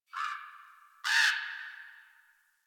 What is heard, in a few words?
Tree Critters, Ambience; Warframe; Duviri